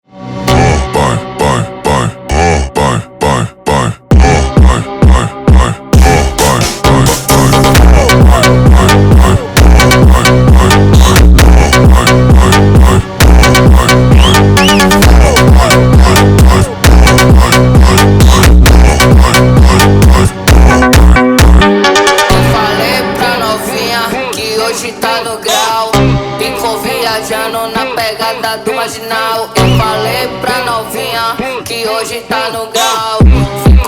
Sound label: FUNK, Brazilian Phonk